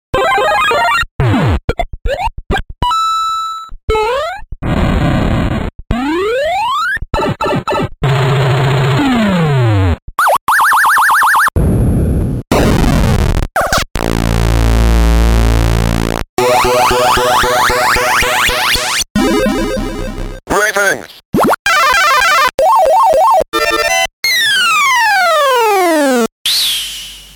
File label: Sound Of Arcade 8-Bit Games; WOOLYSS